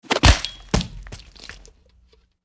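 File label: Chop Off Head Head Fall, gore; gore stabs & eat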